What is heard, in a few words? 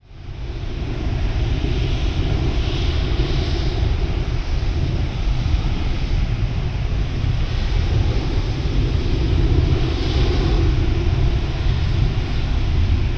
binaural